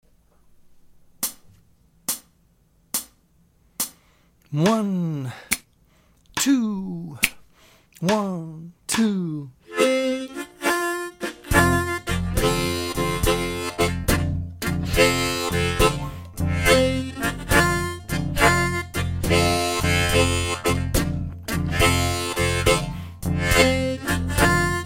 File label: Bird Inst in F Acoustic at 70bpm